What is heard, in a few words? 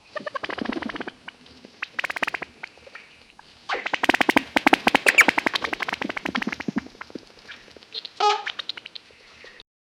small creatures crawling; grotesque